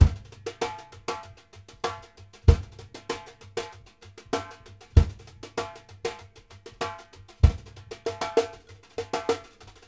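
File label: LIGHT TOUCH, BRUSH 96; Sampleheads - New York City Drumworks VOL-1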